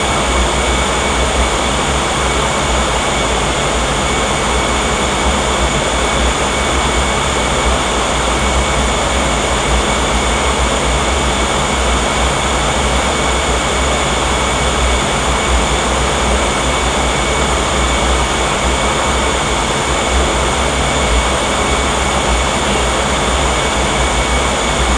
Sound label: paranal ut1 chiller; soundscape